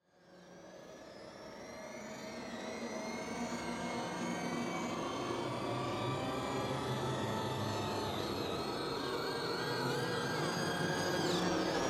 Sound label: Atmospheric; 99Sounds; The Weird Side Samples